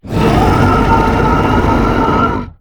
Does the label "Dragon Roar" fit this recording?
Yes